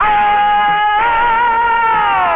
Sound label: vox